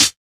damped high hat sound c sharp key, Royality free hi hat tuned to the C# note.; one shots; hat samples